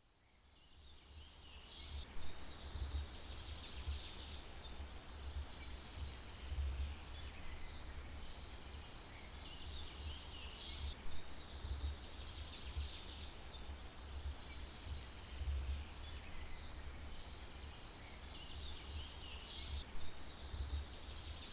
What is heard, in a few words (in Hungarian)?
Bajszos sármány; Madardalok